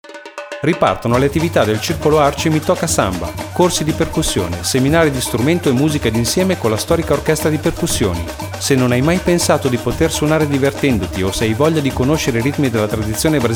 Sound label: Promo, samba